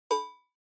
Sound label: Cowbell Synth